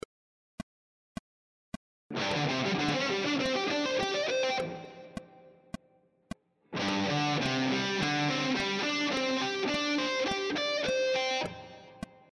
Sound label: triad arpeggio sequences, guitar